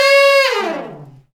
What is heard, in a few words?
brass fat falls; Roland LCDP06; Fat Section